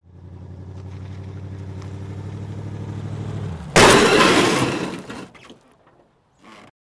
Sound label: Drop Impact Moving Vehicle Collides With Stationary Vehicle, R To L